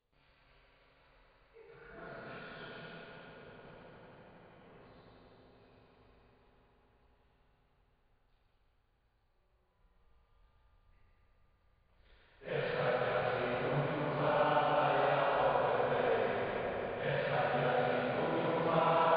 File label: Choir